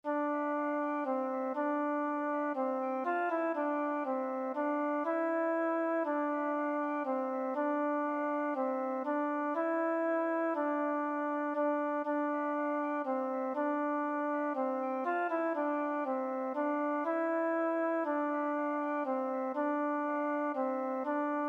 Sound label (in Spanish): 2 VOZ; Beban